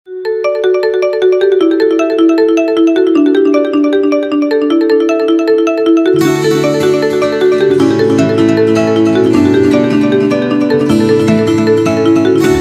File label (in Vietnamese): khong loi xiaomi redmi a2 plus